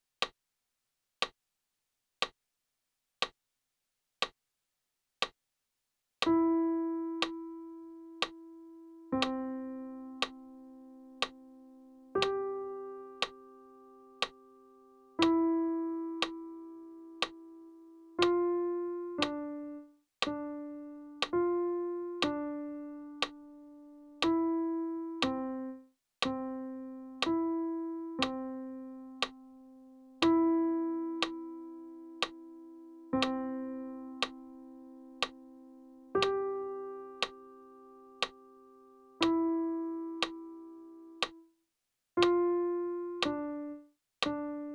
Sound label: Little Church Bells 60BPM, piano